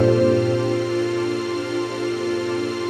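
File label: trance pad; Best Service; XX Large - Pads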